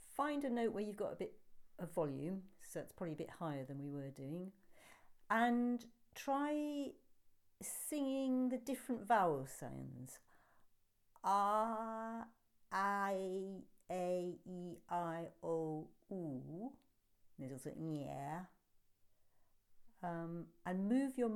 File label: Hums and vowels